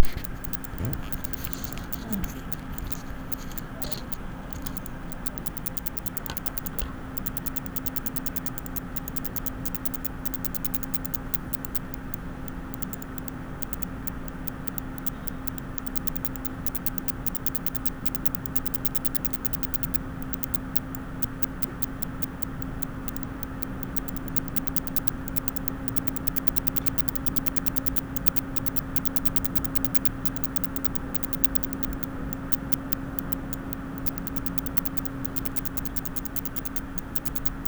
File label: ST45SF Noise; silverstone